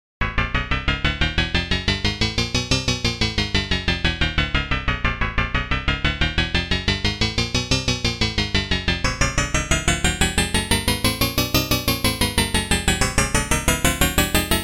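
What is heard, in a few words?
Triple Metal, Chromatic hits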